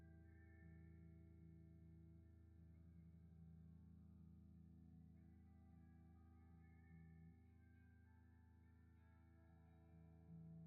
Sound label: ELECTRIC GUITAR; MyMix Recordings NAMM 2018